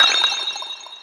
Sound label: Magic ICEHIT